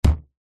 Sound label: bow arrow release